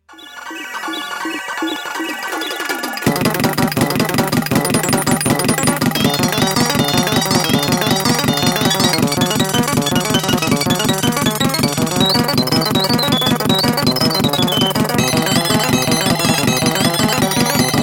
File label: congrats music